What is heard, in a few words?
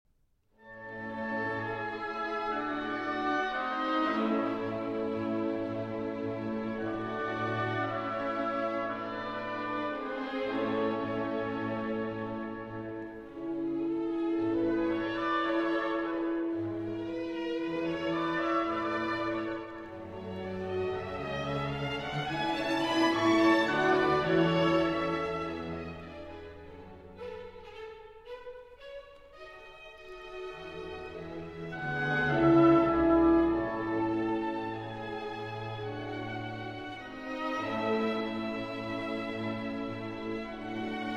Concerto No. 4 in D Major for Violin and Orchestra